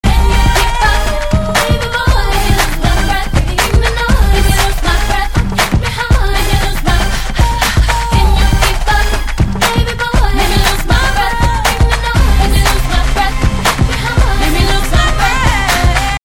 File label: bbox